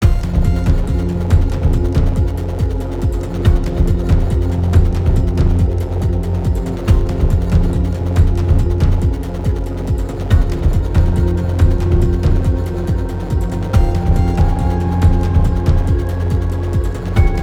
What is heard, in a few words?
SciFi Horror; Sci-Fi Horror Music Pack Vol. 2; Still Breathing